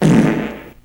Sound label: fart short